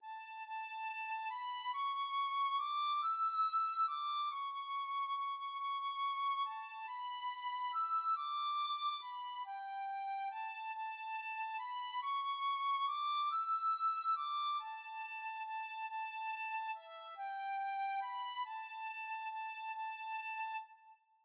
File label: taladh chriosta, tin whistle